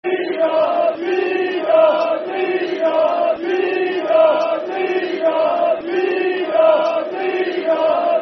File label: Siren Song Football Chant (Soccer Song); Rotherham United Rufc